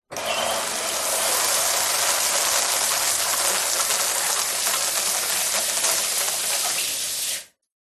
household shower on run off short modern close to head